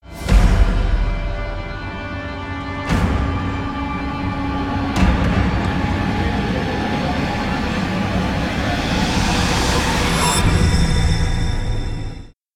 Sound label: Scarry effect; Spookslot Tunes Effecten